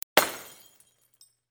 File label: Art Glass Break